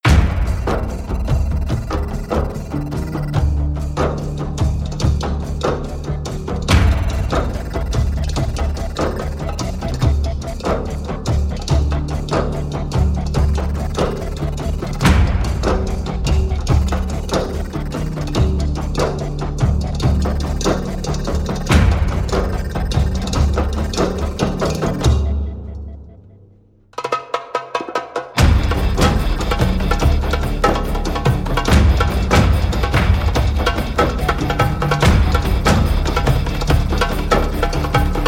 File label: no pads